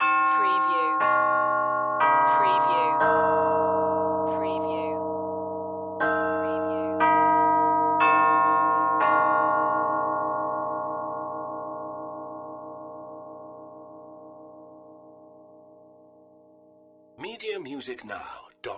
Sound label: Grandfather Clock, Chime